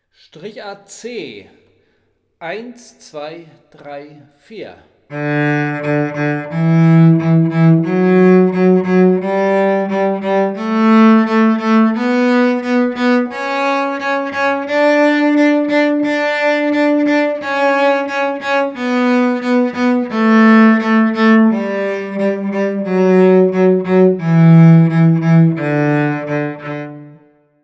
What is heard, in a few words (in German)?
Tonleiter Strichart